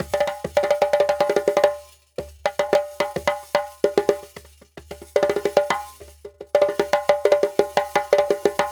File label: Djembe with Kessing, African; Sonic Foundry (Sony Creative Software); Continental Drift Aftershocks